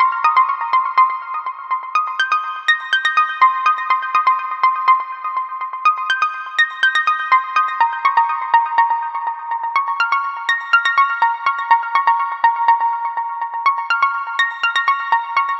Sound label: arcade stabs; lucah